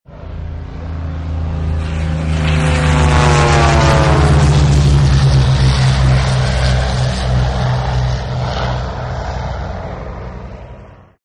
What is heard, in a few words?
Squadron Flyby, Airplane; Partners In Rhyme